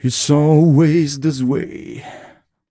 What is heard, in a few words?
m 128 mel e-flat, Vocals